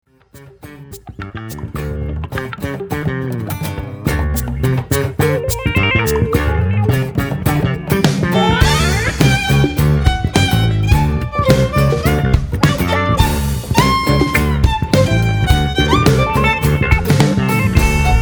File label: comical; Music of the Year 2015; Xenoblade Chronicles X